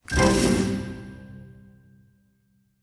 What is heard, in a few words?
scatter landed; netent